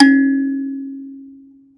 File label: Finger, Prepared Kit; Battery 3 Sample Kits